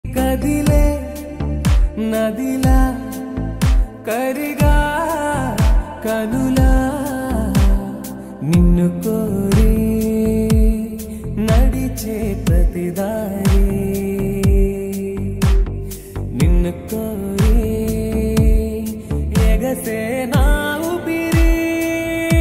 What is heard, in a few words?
telugu love song